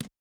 reel Bounce; netent

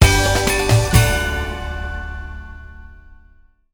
small Win; netent

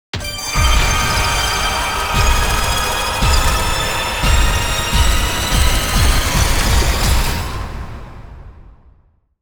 dracula big Win; netent

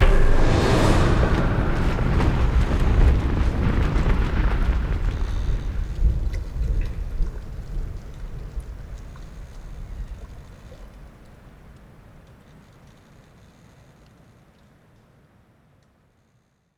intro Screen Trans; netent

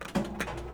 Spin Start

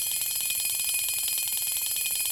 count Up Loop; netent

